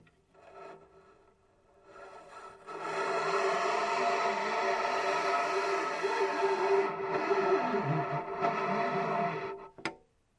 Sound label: Metal Cord Movement; cables metal